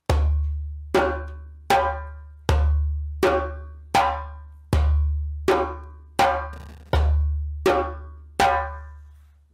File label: bowl sound